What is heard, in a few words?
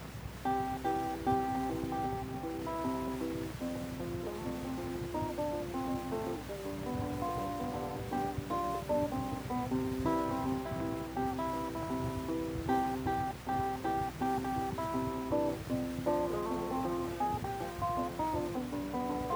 Playing guitar